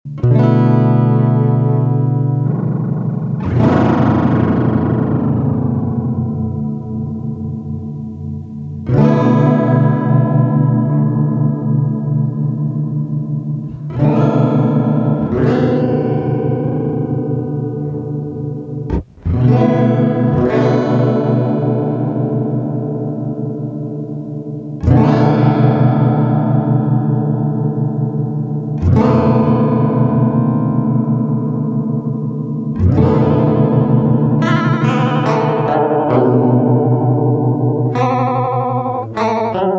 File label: decade seq fast, CD4017 decade sequencer